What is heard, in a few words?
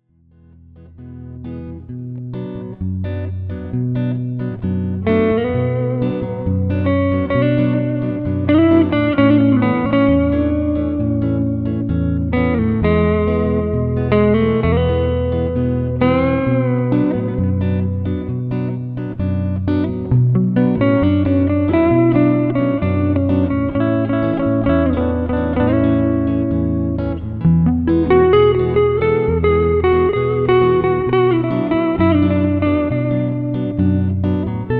solo